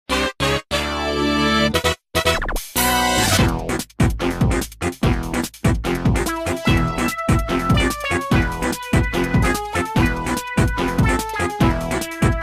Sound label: Music Boom Boom Battle Theme; Super Mario 3D Land